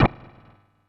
Eat; Super Smash Bros; Kirby

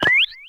Flying Manta Ray

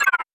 Whoosher; Mario; Super Smash Bros

